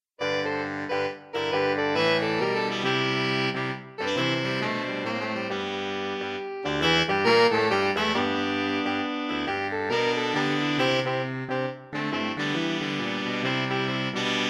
Good King Wenceslas, jazz carol for saxophone quartet